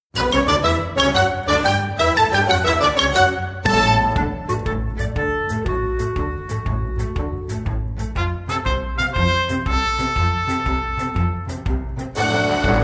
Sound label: transition to forest